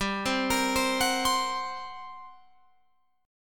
G Minor Major 7th Double Flat 5th, G, Guitar, Open F; GmM7bb5; arpeggiated